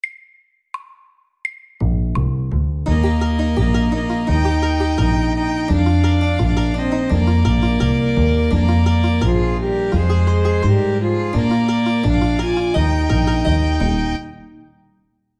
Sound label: Country and western MEDIUM 85